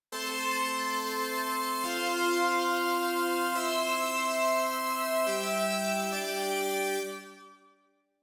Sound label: pad